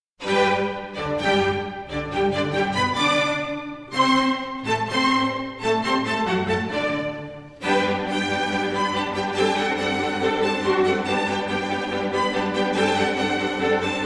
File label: Serenade in G Major, Allegro; Serenade No. 13; Eine Kleine Nachtmusik